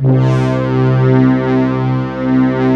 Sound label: HUM BRASS C2, Analog; USB Soundscan vol.49 - Analog Fantasies; AKAI